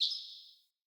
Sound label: Bird Single Chirp; Pothia Bird